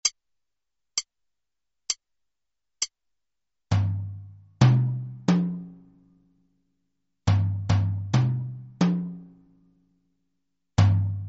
Sequenza Melodica Tom e Timpano; Time = 65

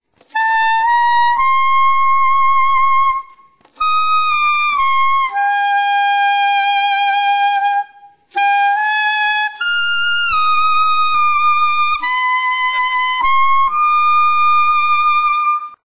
sovracuti frase aperta, Sax

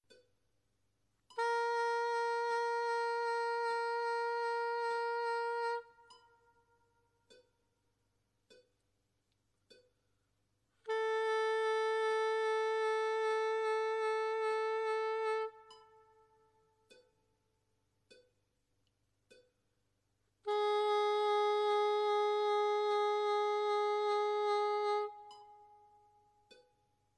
esempio vibrato terzine

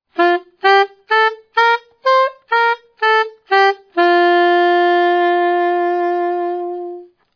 scala puntata

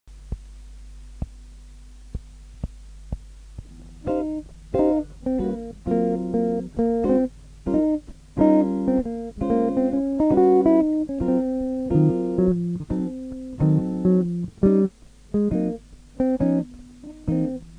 blues, chitarra

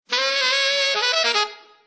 frase growl, sax

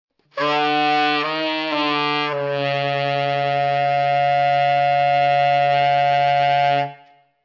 multi da armonici, Sax

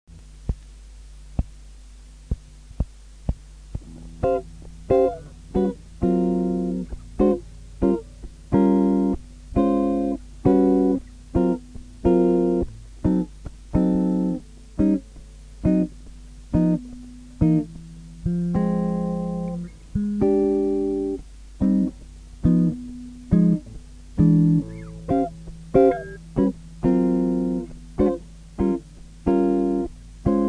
blues accompagnamento, chitarra

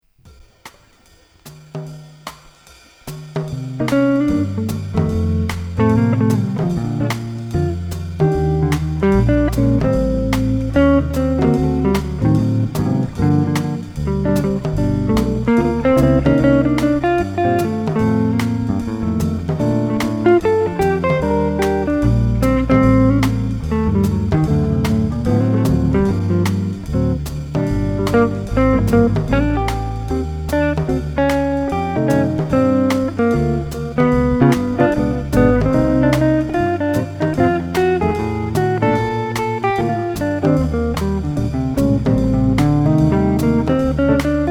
autumn D melodia

